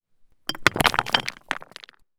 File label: rocks, foley; M8; percussion and foley